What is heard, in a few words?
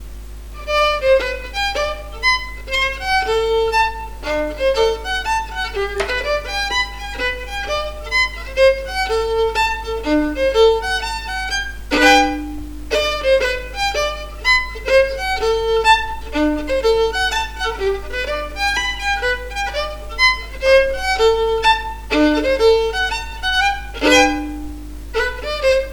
mazurka